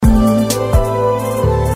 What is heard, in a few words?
New Piano Sms